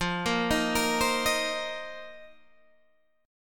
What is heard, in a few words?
F 7th Suspended 4th F down Guitar Open G